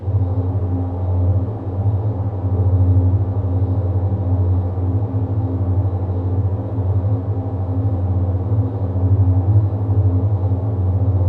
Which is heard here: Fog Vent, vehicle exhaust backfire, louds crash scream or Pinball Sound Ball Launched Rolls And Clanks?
Fog Vent